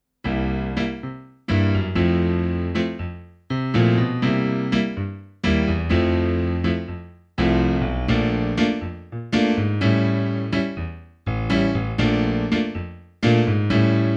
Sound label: Piano